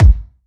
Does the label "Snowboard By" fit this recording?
No